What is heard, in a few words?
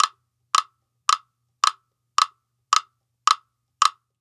Wood Block; Sonic Foundry (Sony Creative Software); Continental Drift Aftershocks